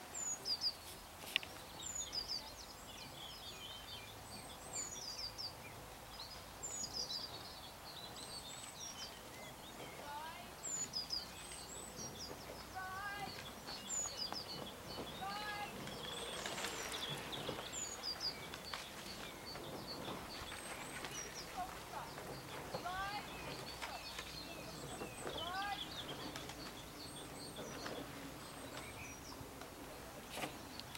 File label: thames towpath ambient